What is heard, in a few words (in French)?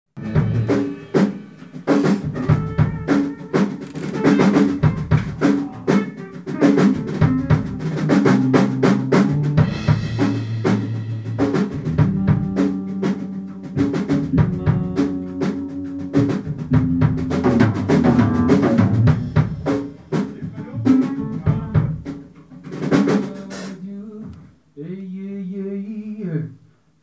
fond musique